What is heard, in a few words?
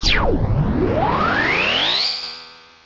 Dark Pulse; pokerogue; battle anims